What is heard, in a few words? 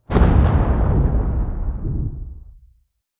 titan ship death; apocalypse